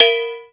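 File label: FRYPAN, percussion metallic; Rarefaction; Poke in The Ear With A Sharp Stick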